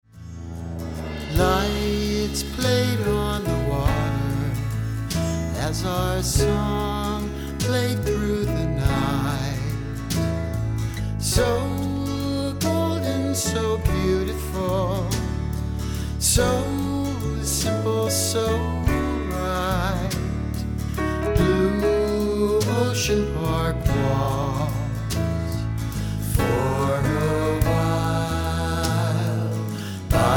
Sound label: Blue Ocean Park Waltz